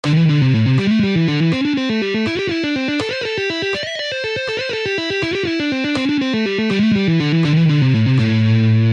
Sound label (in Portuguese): Exercício tapping